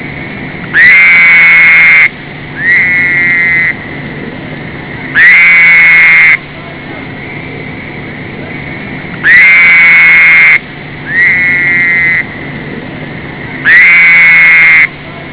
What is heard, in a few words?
fowlers toad